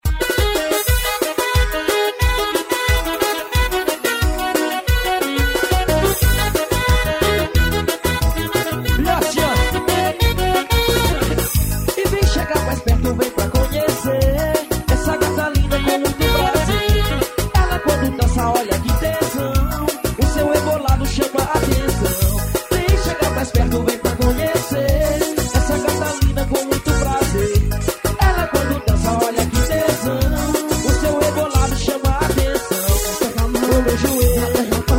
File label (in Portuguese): forró ao vivo; pesqueiro fernandes